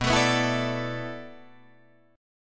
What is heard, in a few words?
B Minor 11th B down Guitar DADGAD; strummed